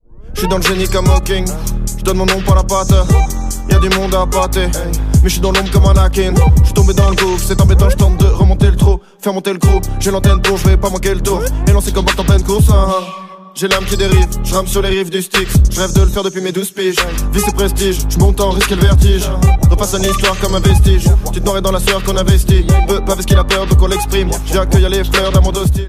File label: level up